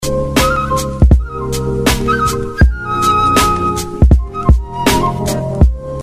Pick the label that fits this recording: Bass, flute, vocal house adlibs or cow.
flute